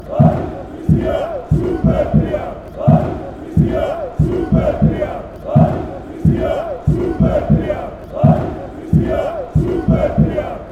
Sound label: chant